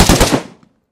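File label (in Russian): звуки выстрелов из железного бункера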